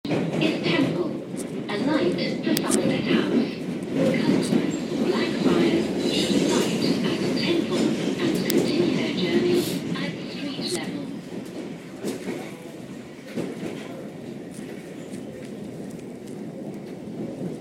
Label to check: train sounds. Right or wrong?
Right